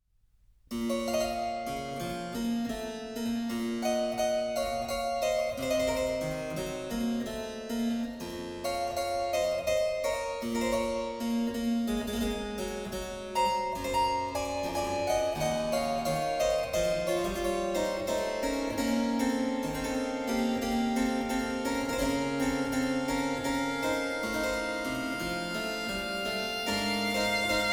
Sonata No. 1 in B Minor, Adagio, Violin and Harpsichord; BWV 1014; Bach; J.S. Bach